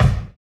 RUDE KICK, HIP-HOP; Drums In Your FACE!

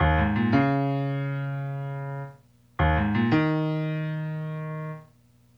PNO, Hip Hop and R&B Drumloops; Best Service ProSamples vol.01; AKAI

